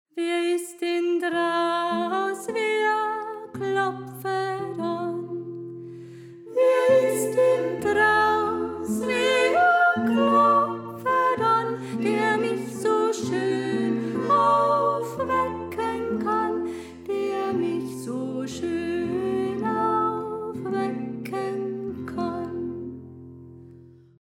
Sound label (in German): Sopran; Wer Is Denn Drauß